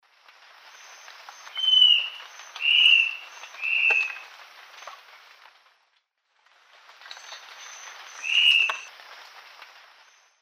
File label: Phlegopsis nigromaculata, song; S. America; Eastern Lowlands